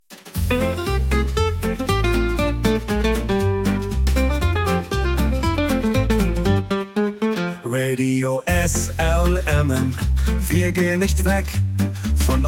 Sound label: Swing